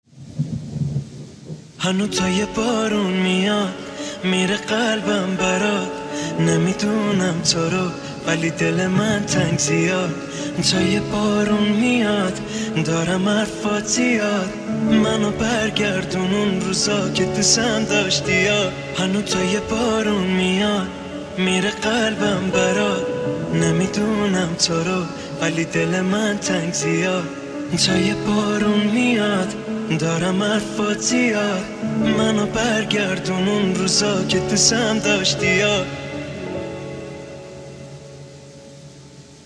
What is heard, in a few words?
Version Piano